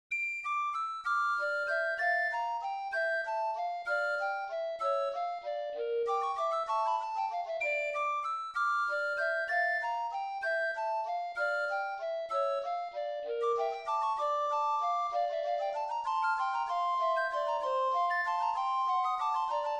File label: Rondeau, trio; Bach